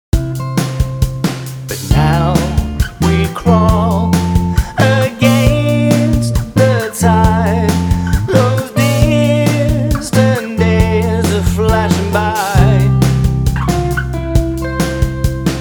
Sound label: Folk, Modern 4 Piece Folk Band; The Folk Sons